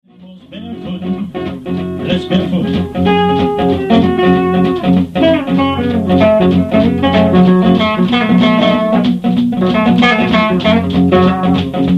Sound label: solo lespaul